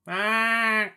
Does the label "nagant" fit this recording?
No